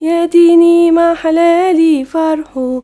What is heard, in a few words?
YOUNGIRL, Arabian Traditions